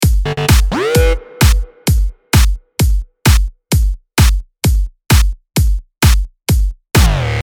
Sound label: ELECTRO MIX